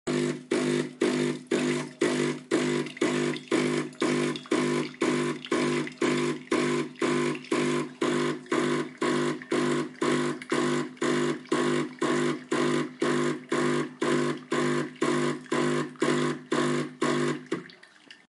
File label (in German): Kaffee, delonghi magnifica aufheizen sehr laut; Geräusch beim Aufheizen